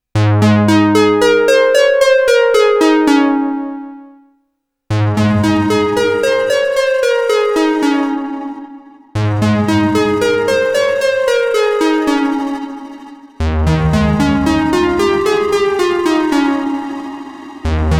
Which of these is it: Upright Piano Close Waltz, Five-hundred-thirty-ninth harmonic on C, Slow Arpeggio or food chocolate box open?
Slow Arpeggio